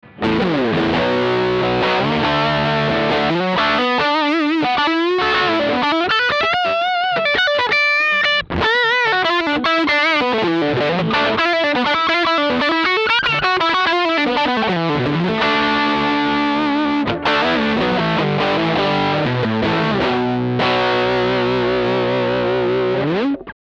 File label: wayhuge green rhino overdrive mkv